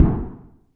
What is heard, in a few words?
ECHO SNARE, DRUMS; Music Store Sound Service; Einstein